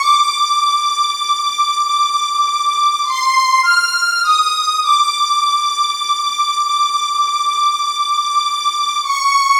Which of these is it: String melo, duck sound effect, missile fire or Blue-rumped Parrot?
String melo